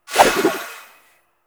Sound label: Kunai Whoosh, Weapons; Warframe; Choir